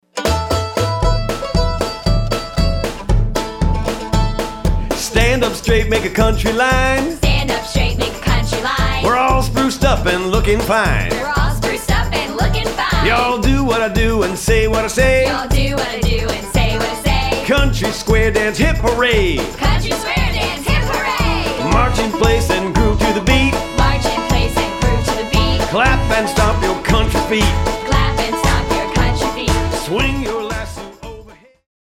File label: Square Dance Cadence; kids country